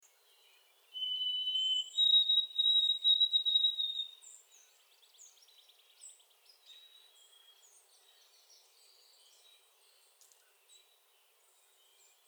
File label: White-throated Sparrow Songs